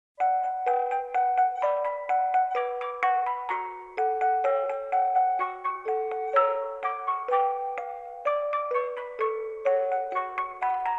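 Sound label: gitara, guitar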